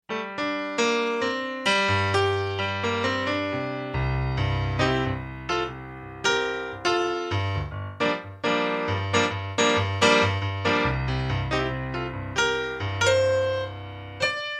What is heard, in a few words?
Piano